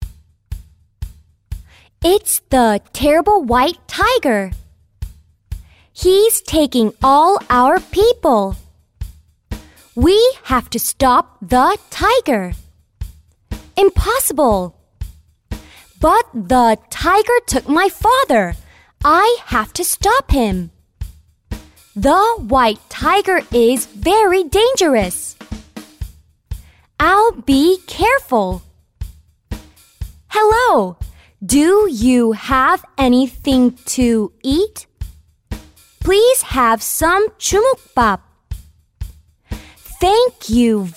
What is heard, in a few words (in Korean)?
영어여 챈트